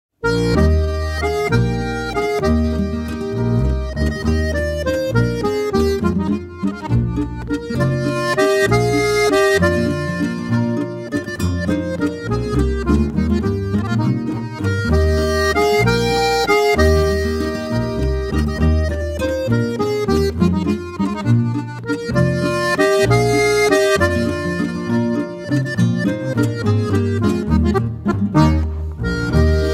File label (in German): Landler